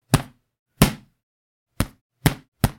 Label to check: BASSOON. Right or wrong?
Wrong